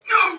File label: woof woof